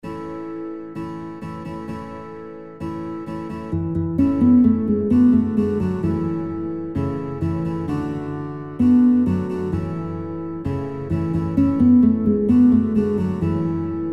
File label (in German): Guitar Meine Oma Faehrt Im Huehnerstall Motorrad; Guitar Songbook